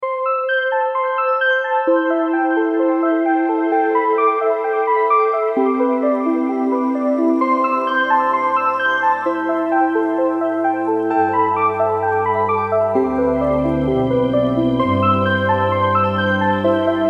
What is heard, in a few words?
oversimplified alarm clock